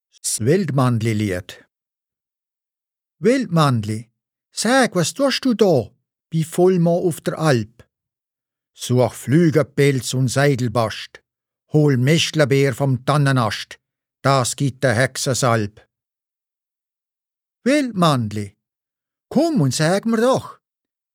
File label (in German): Liad, Mundart